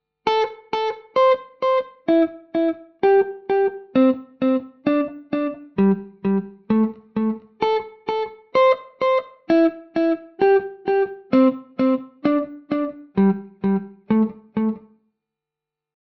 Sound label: delay